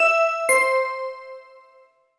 Notice Dingdong; pangya fantasy golf pc

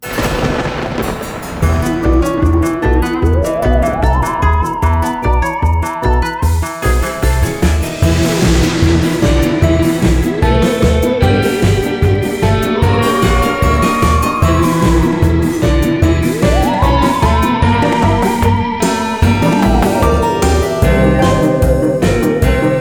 Menu; Halfbrick Studios; Fruit Ninja Halloween

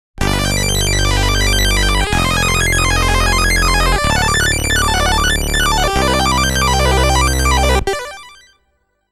Danger Freak Jingle; SID Anthology Vol. 2

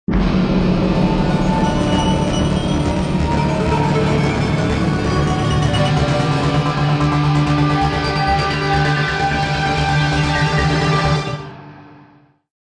Modern Agitated; Civilization Revolution; Xbox

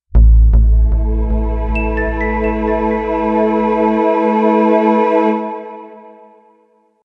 Scenario Clear; Clock Tower 20th Anniversary Sound Collection

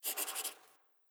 small drawing

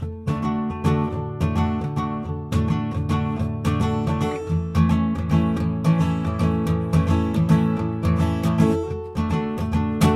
Guitar Music; Assassin's Creed III

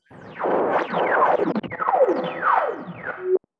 ending fun

